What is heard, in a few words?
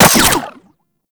Fire Mech; Weapons; Seer; Warframe